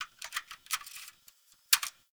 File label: Computer Room Floppy Disc